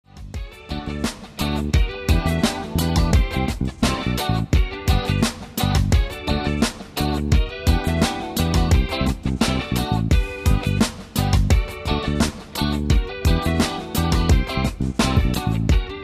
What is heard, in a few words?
acoustic songs Reggae